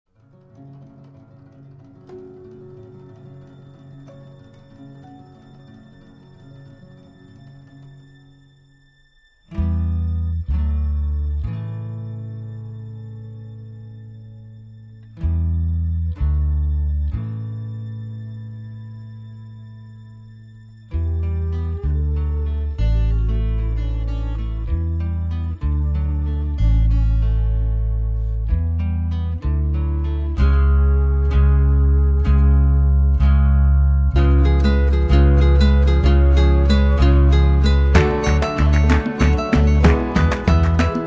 Instrumental

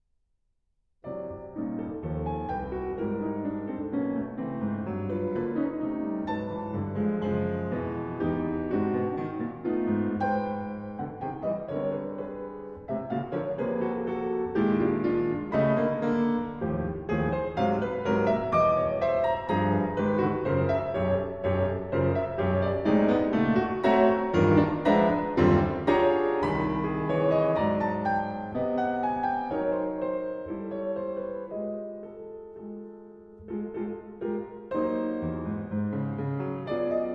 Piano Suite, Gavottes

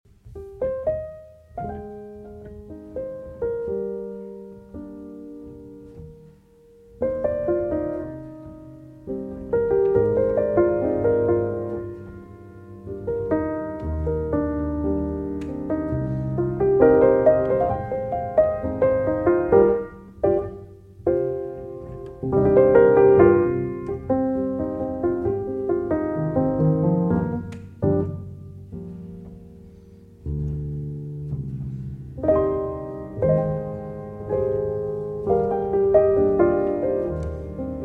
Piano

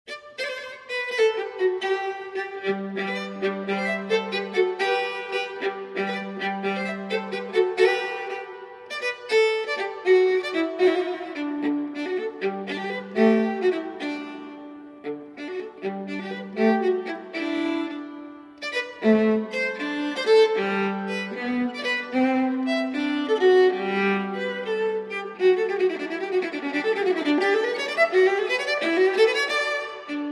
Furlana (Arr. For Viola)

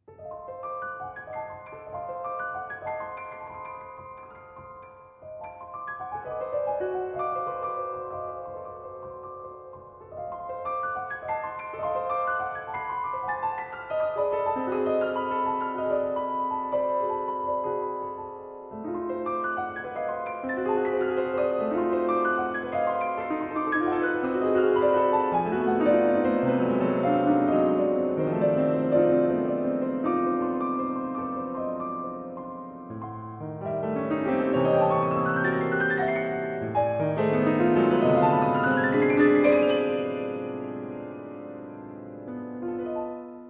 Solo Piano

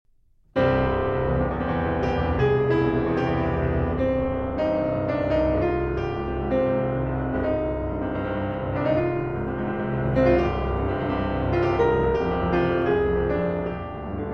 Cello Sonata III. Allegro Appassionato

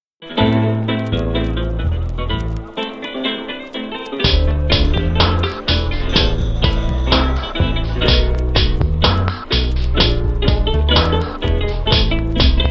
Tango, Bandoneon; Bandoneon Arrabalero

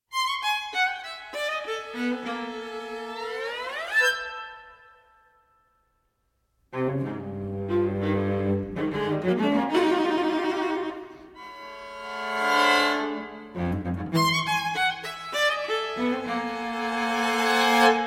String Quartet

